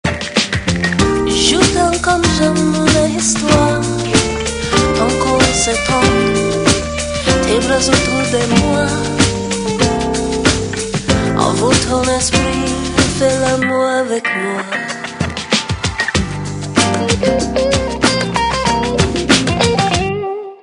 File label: Jazz Riff; Motorola PEBL-U3 China